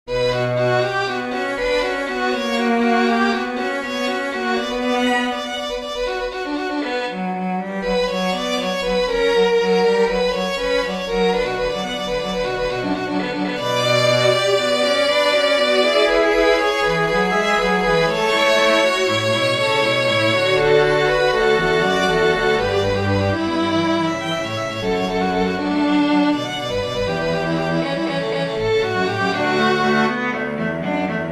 sinfonia b minor for string trio; bach johann sebastian

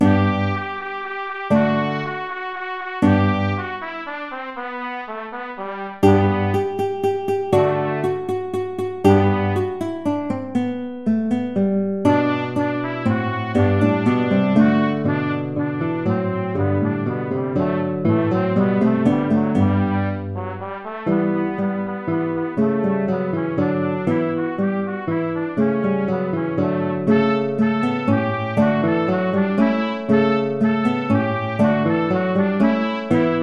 old hungarian dance

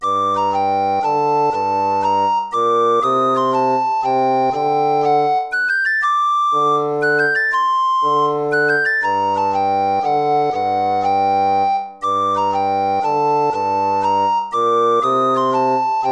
baroque minuet minor

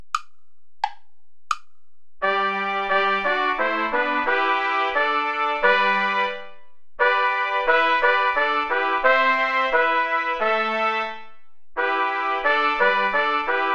play along without trumpet

